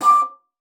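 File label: D3, bass flute; Xsample VOL-2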